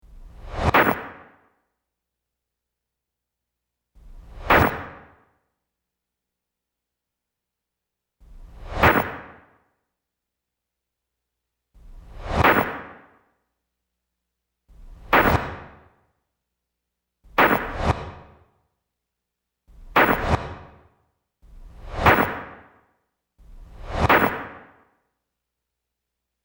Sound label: japan arrow